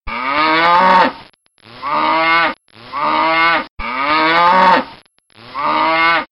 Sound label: cow